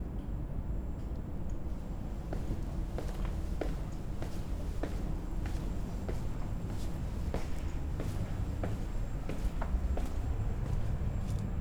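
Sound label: 3.6-metre telescope 2nd floor, La Silla; soundscape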